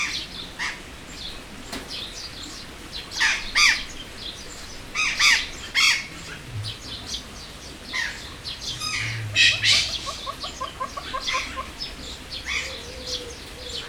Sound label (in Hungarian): tokó kotyogó hang, piroscsőrű tokó; jaszberenyi zoo 2016